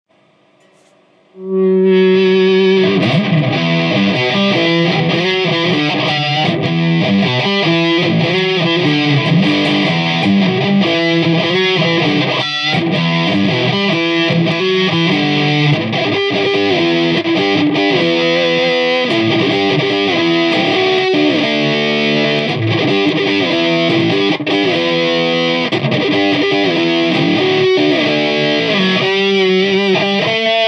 solo with own improvisation at back vintage b c rich mocking bird